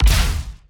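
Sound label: Laser gun Ballista Fire Short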